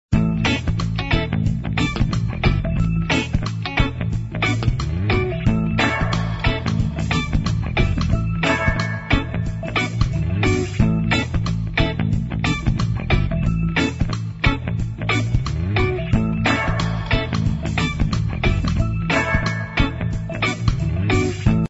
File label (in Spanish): REGGAE, musica libre; Música de librería gratuita